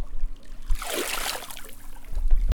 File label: Water Splash